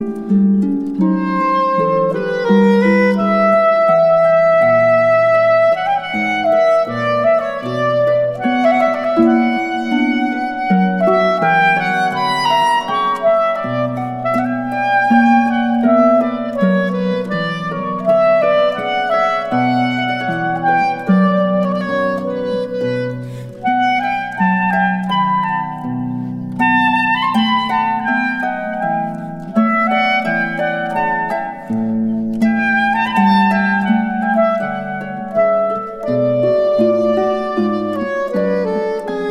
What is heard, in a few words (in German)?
adagio und andante sopransaxophon